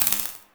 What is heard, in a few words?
Laser Skin Printer Laser Stop; Ambience; Props; Warframe; Entrati